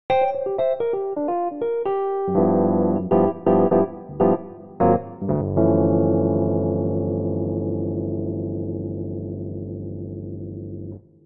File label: tremolo